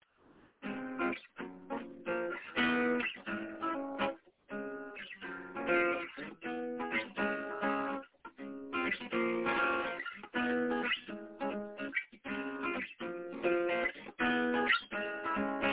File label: smooth jazz chords